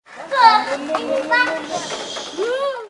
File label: Shsss